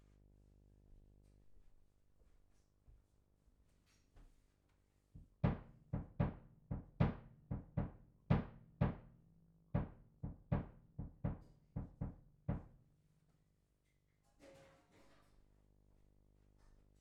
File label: Bass Mic